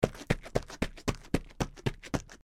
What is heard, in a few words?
Run; The Adventures of Puss In Boots - Catch The Thief; NextlevelGames